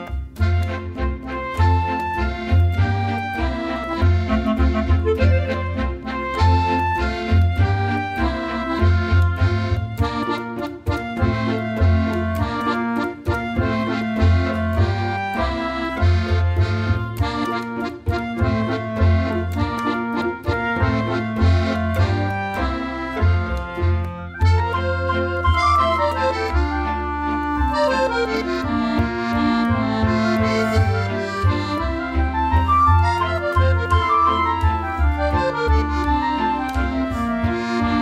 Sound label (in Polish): kapela